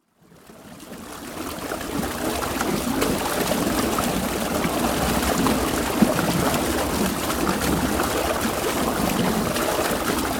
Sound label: Streams, Stream flowing down drain; River; Nature; BBC